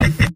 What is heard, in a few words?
laugh short; sniper; video games; source engine; tf; mvm